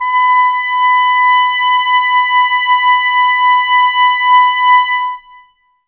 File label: clarinets, Wood Winds